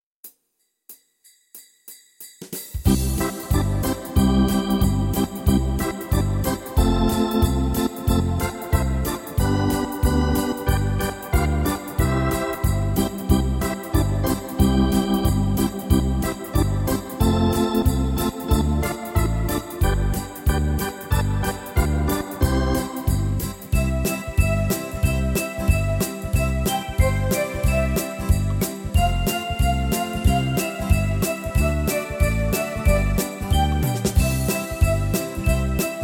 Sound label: jingle